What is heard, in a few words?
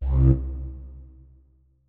flute notes; Hideout Furniture